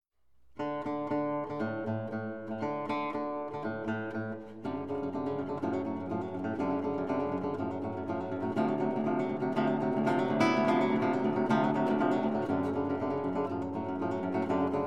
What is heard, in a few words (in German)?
Gitarre